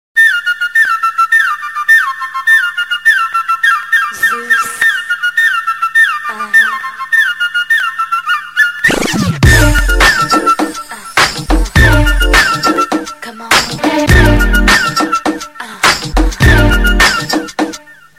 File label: Friction